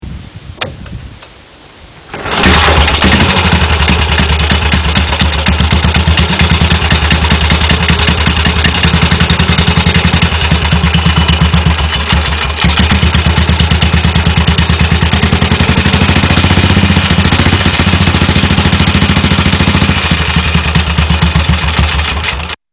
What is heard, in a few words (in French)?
Moto demare